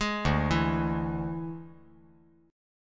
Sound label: D Suspended 2nd Flat 5th D, chord